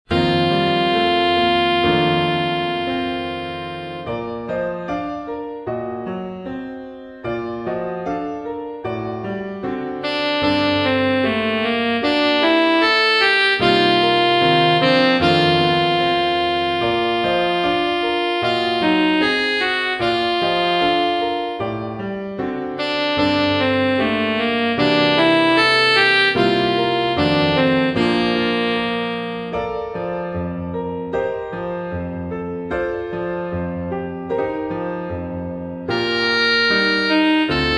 Romance w Sax; Part 3 Full

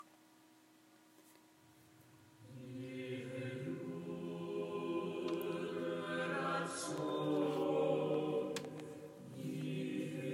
Yihyu Lratson Choir Singing

